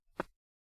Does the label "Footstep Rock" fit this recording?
Yes